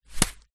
leisure juggling bean bag small catch in hand; zapsplat

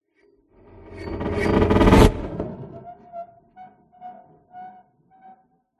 Cinematic Metal Whoosh

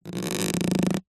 rubber squeak; zapsplat

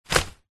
umbrella folded set down; foley

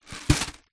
leisure fuse beads in plastic tub set down

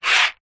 bottle top metal slide on table; foley

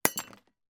impact glass lightbulb smash

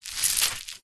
plastic cellophane thin wet squeeze squelch; foley

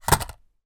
sport skateboard small childs down carpet floor

